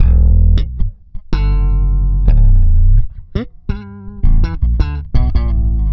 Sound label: Slap, Bass; Bass X